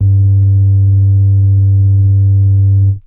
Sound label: Synare Sub Clean Vinyl Synths G1